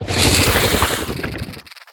Teleporter Round End Victory; Evolve; Wraith

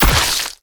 Teleporter Blood Splat; Evolve; Wraith

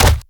Collisions Bodyfall Soft; Lurker; Evolve; Gorgon

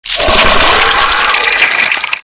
gibber

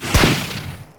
Vortex Blast Impact; Evolve; Kraken

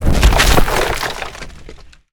Spider Trap Activated; Lurker; Evolve; Gorgon

